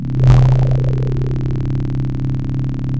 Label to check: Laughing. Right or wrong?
Wrong